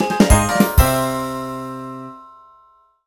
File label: DIALOG GET ITEM; Girls RPG Cinderellife; 3DS